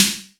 SD VRB, Yamaha RY-10

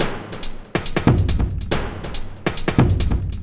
Addon Loop, Drum Loops 140bpm, Perc Loops

